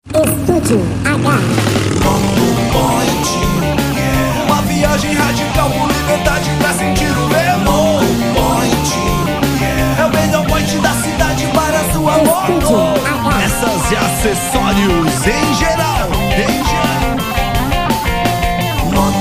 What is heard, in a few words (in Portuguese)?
jingle moto point